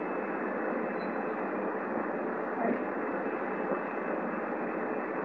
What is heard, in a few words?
yelp; bienvenue house